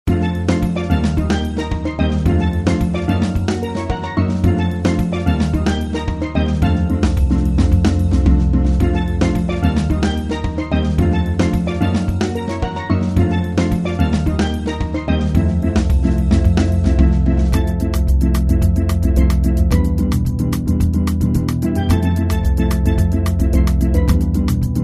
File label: Samba